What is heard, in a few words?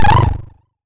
charm